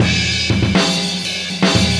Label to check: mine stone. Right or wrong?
Wrong